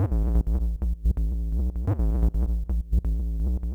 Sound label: wonderland tech bass Am, bass loops